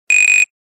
Nokia Buzzer

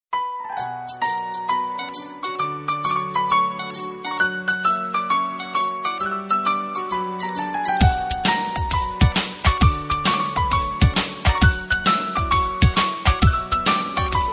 Nice Instument

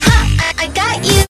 tone

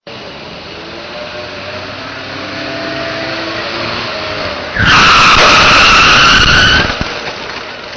Car Crash

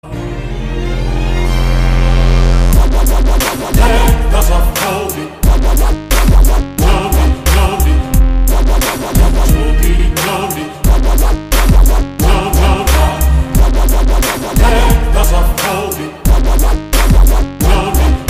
Deck The Halls

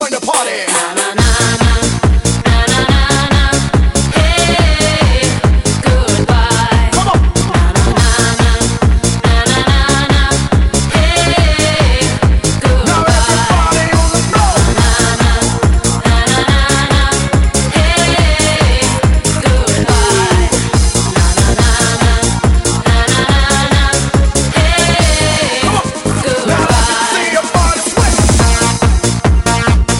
Trance

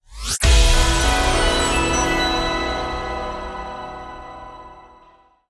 1UP; Gold Berry

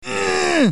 squeeze

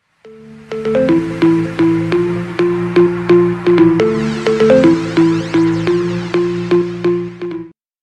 House Sms

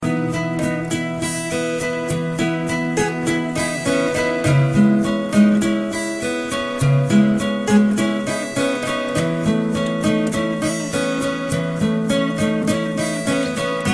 Nice Guitar

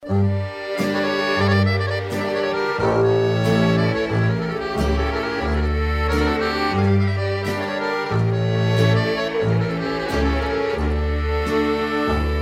Serbian Ethno

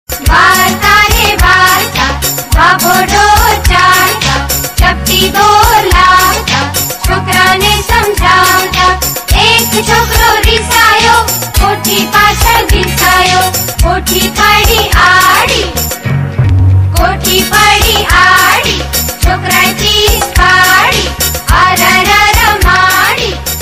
Balgeet Gujarati

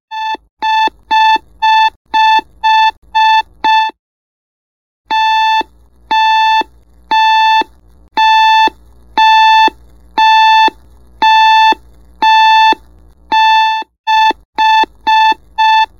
Htc Alarm

